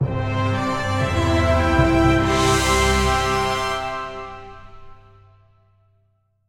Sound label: Game won; Worlds of Magic: Planar Conquest; PS4